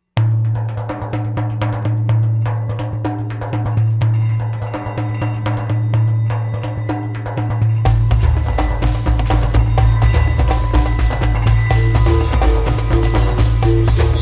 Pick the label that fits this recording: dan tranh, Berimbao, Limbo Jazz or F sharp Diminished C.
Berimbao